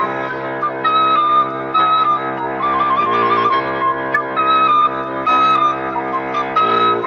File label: flute, Loops Phonky